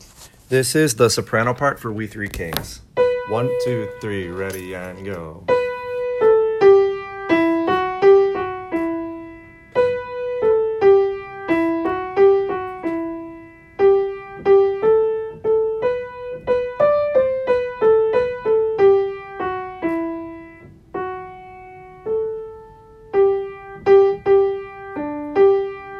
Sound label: we three kings, sop, choir